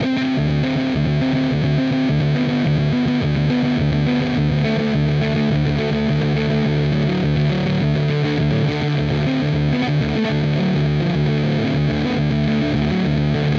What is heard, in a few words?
Neural DSP Quad Cortex Neural Capture Orange Rockerverb